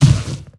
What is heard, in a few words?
footsteps; monster within; sicarius; soundmorph